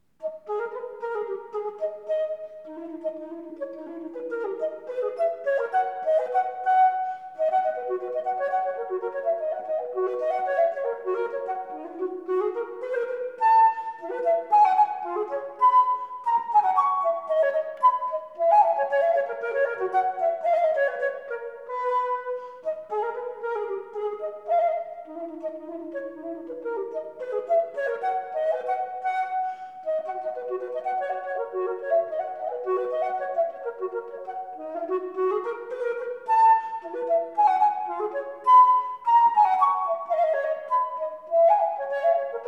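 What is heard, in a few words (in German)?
partita für flöte solo a moll bourrée anglaise; johann sebastian bach; bwv 1013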